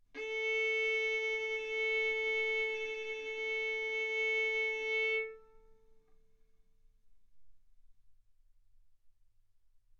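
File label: Violin arco mf A string A4 B4 stereo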